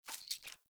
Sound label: Foley Sneeze; Avakot; Friendly